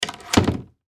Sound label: Pantry Door Solid, Pantry Door Solid Wood Close; Cabinet Doors; Household; Blastwave